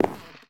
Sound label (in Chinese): 嘎枝之心踩踏声